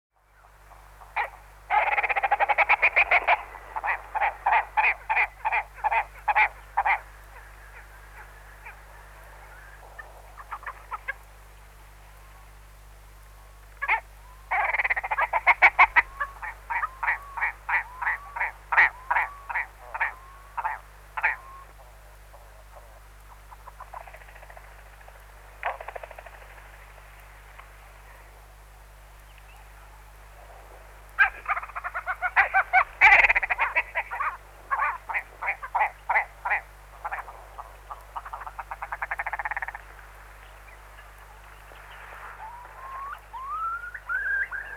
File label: Red Grouse